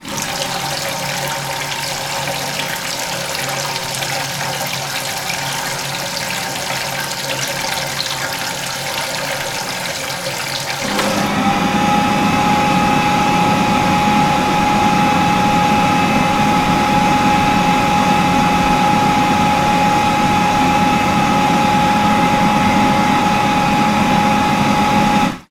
public places auto hand washer dryer public toilets operating; sound effects one